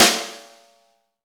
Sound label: snare, Roland; Club-50 - Foundations Roland; Ext. Snare 4